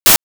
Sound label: noise